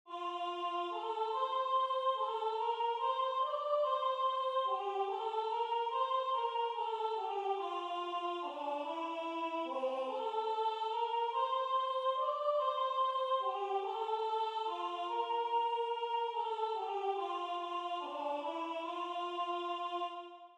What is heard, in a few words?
Soprano